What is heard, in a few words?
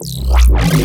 bass purge